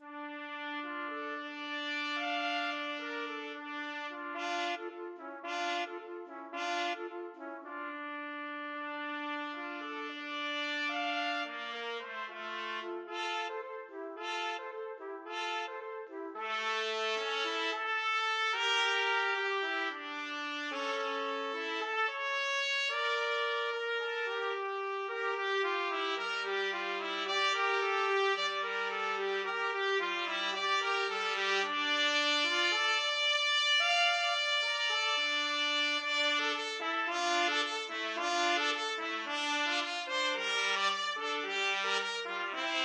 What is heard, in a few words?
From the New World, trumpet duet